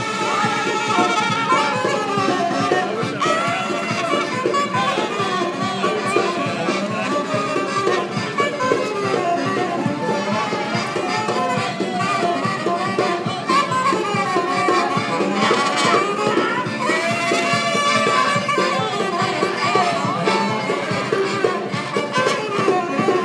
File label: buskers; sutton coldfield